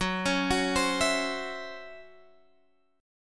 C sharp Minor Major 7th Double Flat 5th F sharp, chord